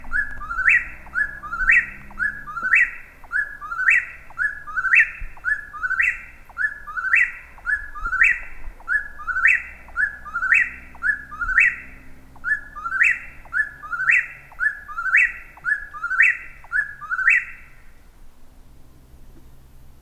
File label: Eastern Whip Poor Will